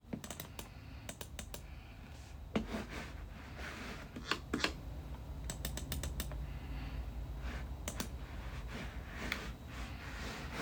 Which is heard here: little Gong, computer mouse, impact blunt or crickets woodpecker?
computer mouse